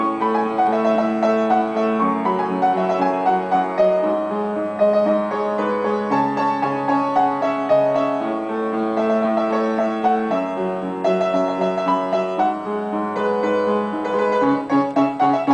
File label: piano version